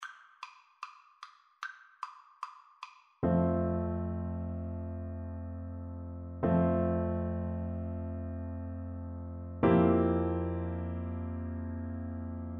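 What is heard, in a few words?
recorder karaoke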